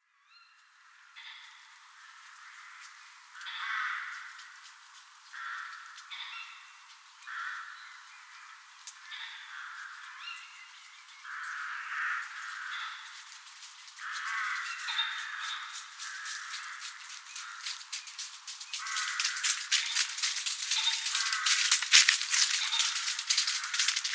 Deer Woodland; wild soundscape

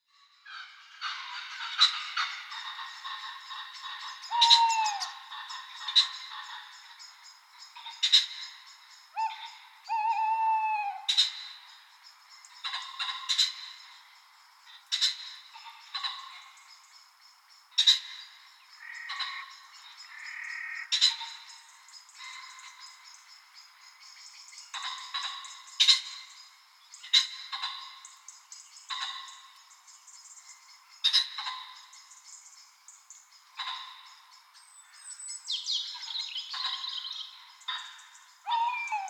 Pheasants Owls Winter; wild soundscape

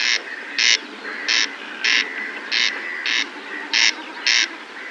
Corncrake

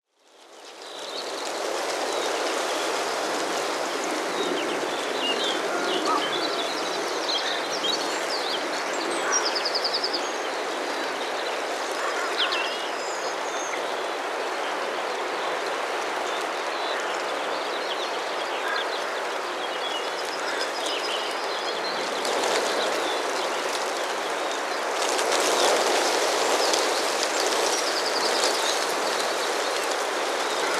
North Leigh wind; wild soundscape

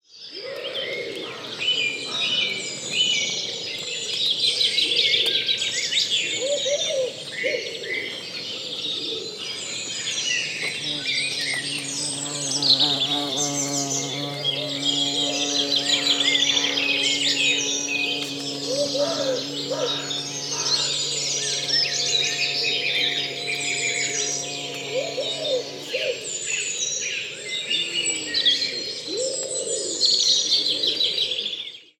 Little Heath BEE; wild soundscape

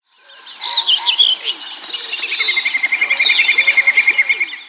Little Grebe

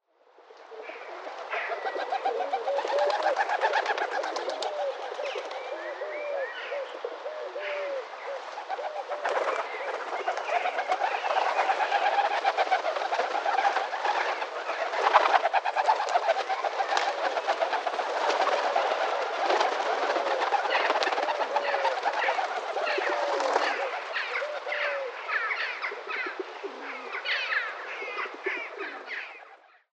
doves in flight; wild soundscape

